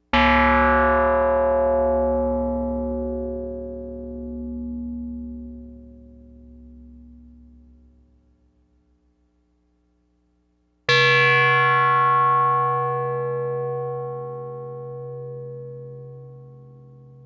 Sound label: Bell